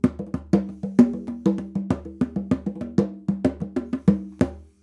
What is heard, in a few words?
Conga ORTF